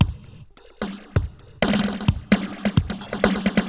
Moog Phaser HR-16B (130BPM), cyborg beats

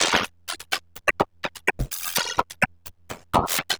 Granular, LOOP 125 BPM; Bolder Sounds - Granular

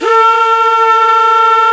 flute vox, singin' flute; Jazz; Roland L-CDX-03 Disk 1; Jazz+Singin'

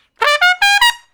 Trumpet Shuffle (G); Crimson Blue and Fabulous Horncraft 4 RnB; Horncraft for R&B; Solos

